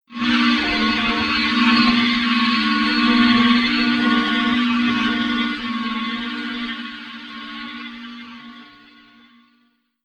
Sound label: pitched event